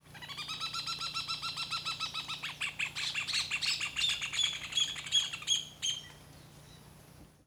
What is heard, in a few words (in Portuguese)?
Joao de Barro Cantando em Quintal de Roca; aves